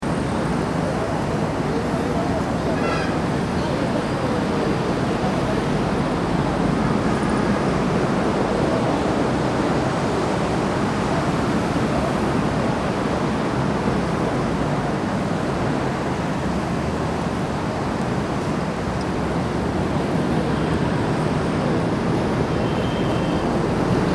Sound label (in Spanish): AUTOS GENTE Y MAR EN LA COSTA VERDE